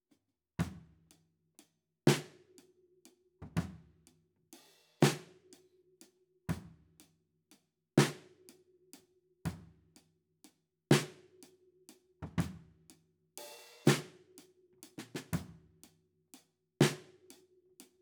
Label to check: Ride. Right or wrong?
Right